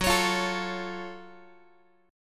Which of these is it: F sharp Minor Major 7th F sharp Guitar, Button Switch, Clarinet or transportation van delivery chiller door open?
F sharp Minor Major 7th F sharp Guitar